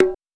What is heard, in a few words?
Conga Medium; One Shots; Drums; Ableton Live 12 Lite; Core Library